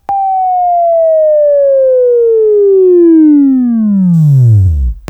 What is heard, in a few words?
Buzz; Labview Program; Sweeping Training program